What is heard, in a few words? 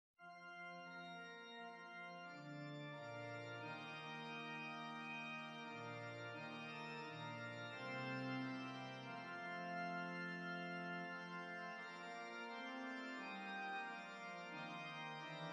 ORGAN PIANO; Rise Up O Men of God